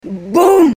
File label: boom